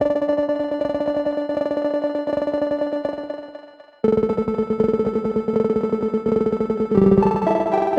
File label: Cm7 rolling keys; Loops; Touch Loops; BlissedOutBeats